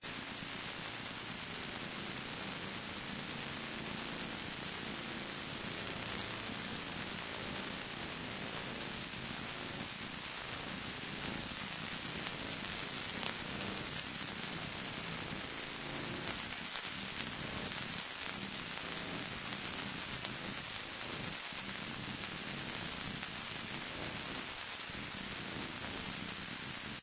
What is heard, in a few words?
Electricity Noise; fa battlemaps